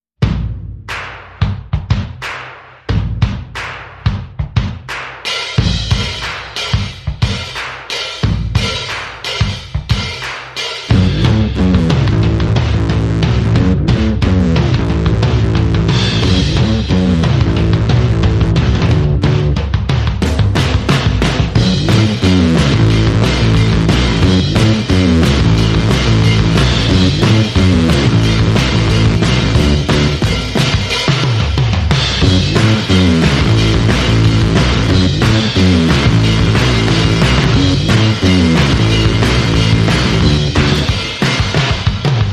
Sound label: Bass and Drums